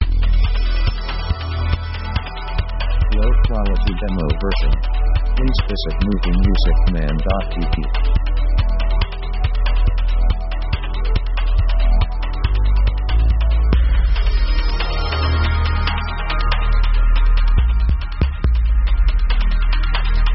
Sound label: Rhythm 140bpm; synth themes